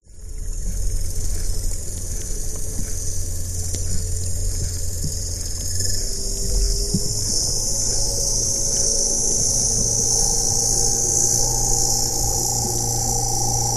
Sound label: transport boat motorboat pass underwater recording hydrophone